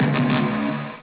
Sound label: beep bassoon and bone